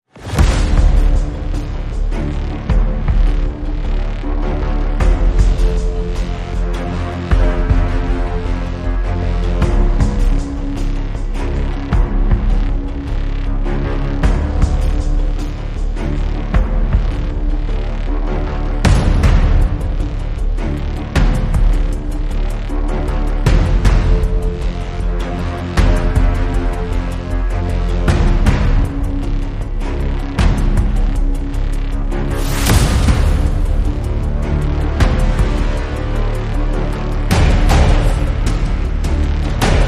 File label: No Strings